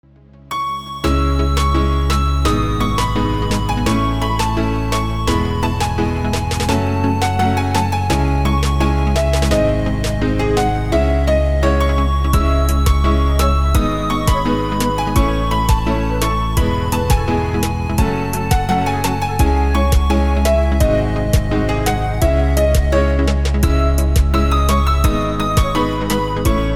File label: flute